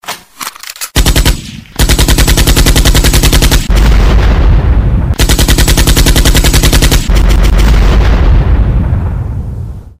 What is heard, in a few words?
Machine Gun